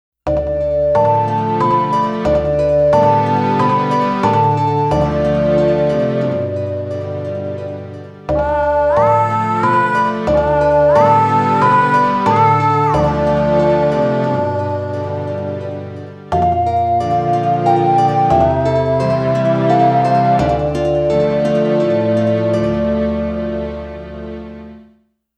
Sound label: Lullaby